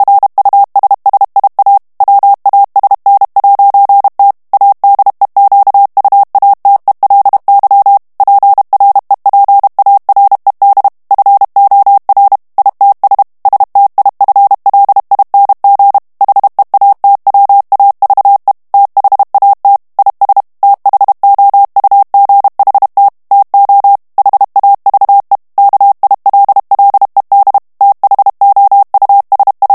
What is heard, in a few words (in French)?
ANGLAIS texte clair vitesse 30 mots minute, morse